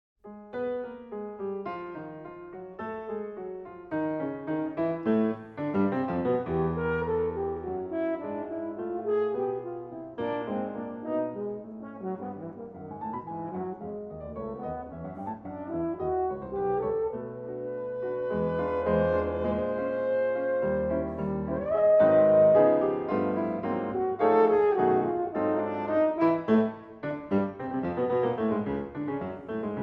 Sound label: Suite for Horn and Piano - Mvt. 1.